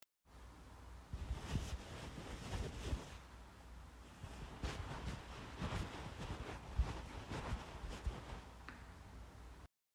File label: Fabric touch